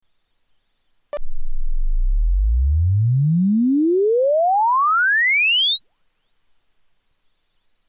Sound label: Sweep logarithmic